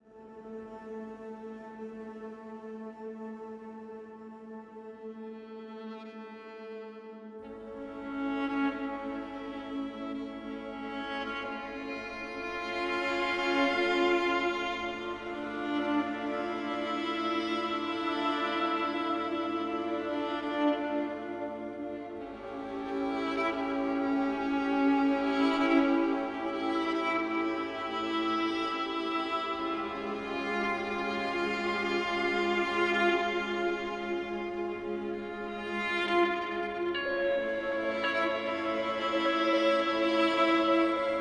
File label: Violin; Waverunner Audio; Struttura; Explore a world where solo violin and cello textures transform into experimental synth-lik